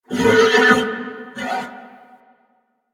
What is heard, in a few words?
Horse Vocal Mount Neigh; Vocals; Warframe; Vehicles; Duviri